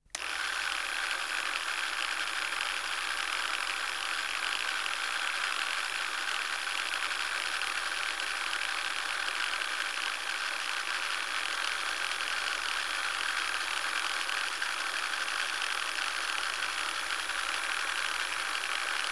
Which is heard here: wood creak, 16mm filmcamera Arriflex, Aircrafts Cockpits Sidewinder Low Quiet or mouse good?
16mm filmcamera Arriflex